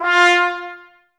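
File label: BRS trumpets F; 90 s Sample CDs; Miroslav Vitous; Woodwind Brass Ensemble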